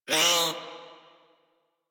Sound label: Feral Vocal Pain; Warframe; Catbrow